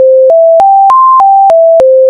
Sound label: arpeggio